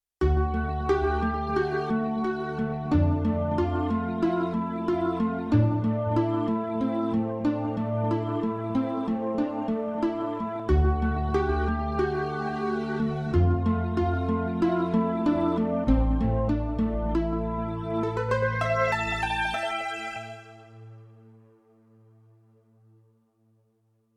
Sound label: Pluk Performance; Yamaha TX16W; Spacey Keys 2